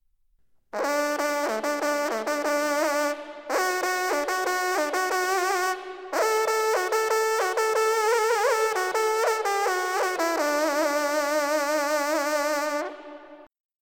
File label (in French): trompes; Duc d Aumale